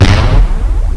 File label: GUN; Death from Above